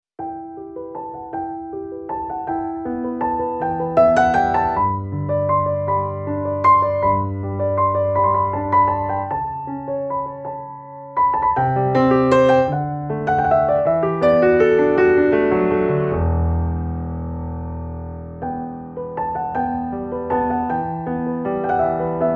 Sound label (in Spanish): instrumental; Nuestra Seora de Czestochowa